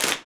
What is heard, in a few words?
CLP HANDS, Handclaps; Roland LCDP14 Africa VOL-2